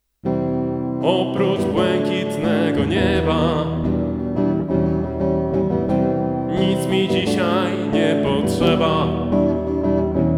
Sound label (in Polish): sala koncertowa